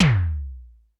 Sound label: Roland U220 Electric Set, Drum Machines